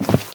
Footstep wood

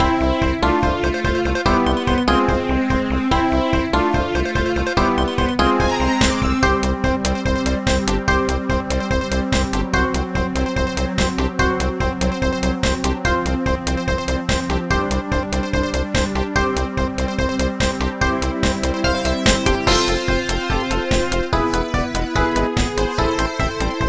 Pop Stage Karaoke; pinky street kira kira music night pop town gamerip

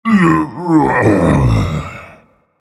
Vocal Colossus Efforts Medium

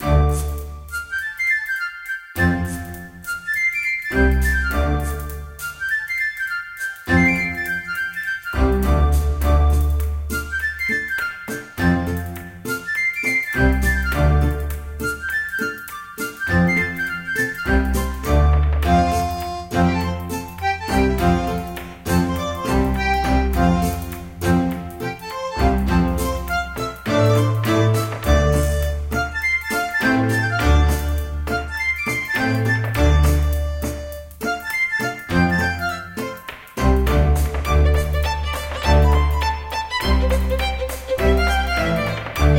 Quest Rock Hopper Low Irish Jig; club penguin island; android

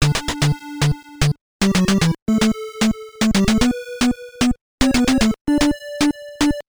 Final Boss Clear; Iron Tank The Invasion of Normandy; NES

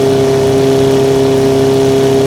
Cadillac Escalade High

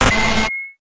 Buzzsaw Gun Shoot

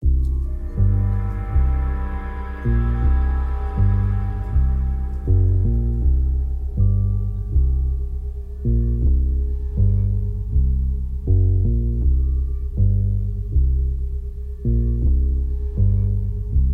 Crime Scene Suspense; Hello Neighbor 2

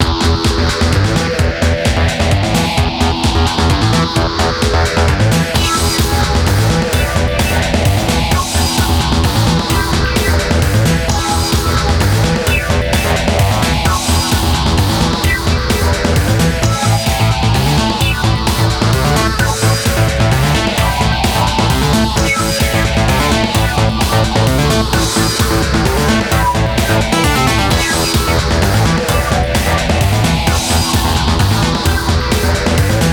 museum menu; WWF Raw; Xbox

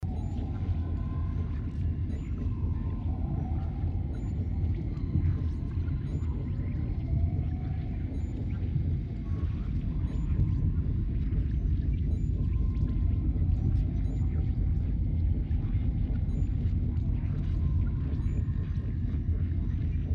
Primary Control Module Ambience; Five Nights at Freddy's; FNAF Sister Location

